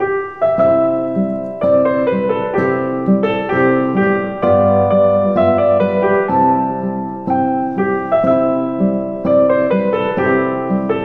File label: Divertimento Piano